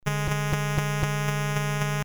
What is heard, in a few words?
dpcm